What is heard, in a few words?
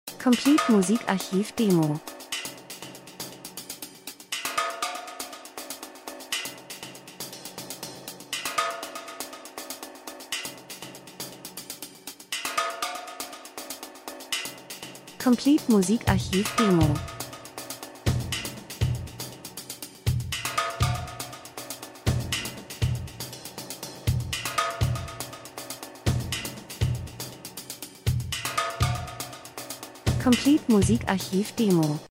drums; Variation Akzent Bassdrum, Spannung, Krimi, Fliessband, Maschine, Industrie, Film, TV, Hihat Bassdrum Becken